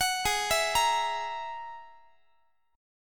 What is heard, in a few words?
Ab 7th Suspended 2nd Sharp 5th, Guitar, 4A,4E,5A,5D,5G sharp,6C sharp; Ab7sus2#5 arpeggiated; x,x,21,18,20,21